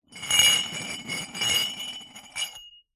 household glass champagne flute scrape slide rough hard surface